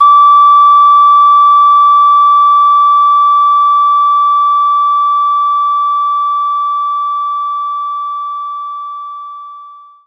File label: Ocarina